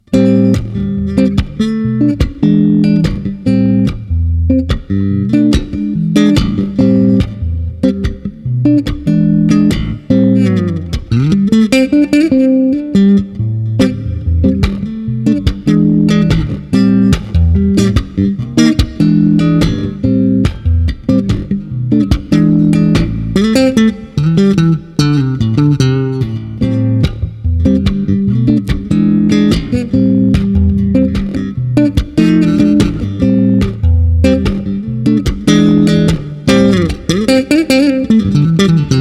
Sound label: solo bossa; Attiva a tre vie; Acero occhiolinato